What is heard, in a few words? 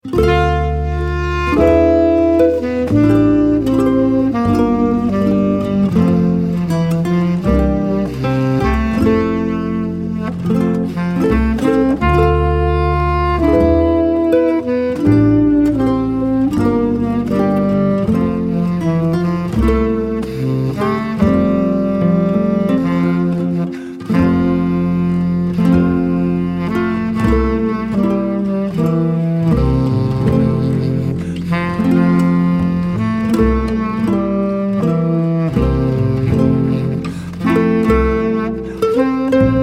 wedding sax trio wedding march